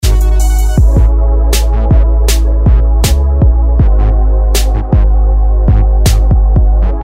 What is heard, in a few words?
Inst